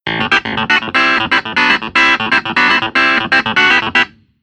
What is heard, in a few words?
Keyboard Clavi Pickup